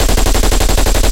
loop sgmt origin, guns tanks

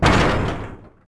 Tank Drop; Civ4 warlords

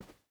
footstep hide carpet walk; Rust

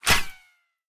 bullet hit armor; War Thunder

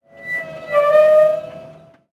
pumpjack pump down; Rust

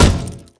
glass run

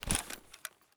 gear fast stereo; Escape from Tarkov

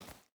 footstep barefoot forest jump start; Rust

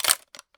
mp5 bolt out; Escape from Tarkov

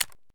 sv98 jam; Escape from Tarkov

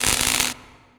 elec spark welding; Modern Warfare

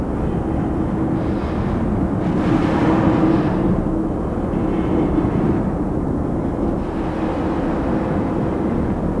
ambience hangar

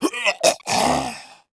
vce die

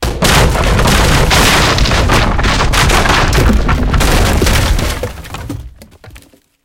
wood building fall mid; tanks; War Thunder

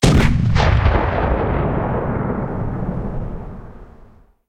cannon 84 94 tail far

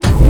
beam source start

